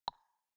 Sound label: movement sounds click out long